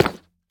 下界砖破坏声

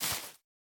孢子花踩踏声

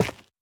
滴水石破坏声

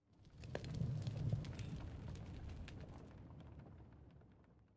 烟熏炉燃烧声

